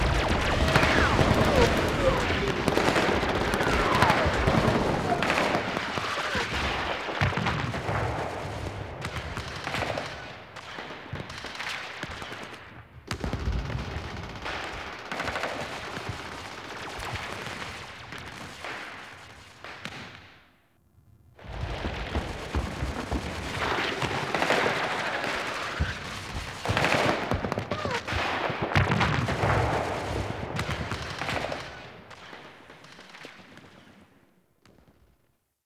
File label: Ambience; Quests; The New War; Grineer Eidolon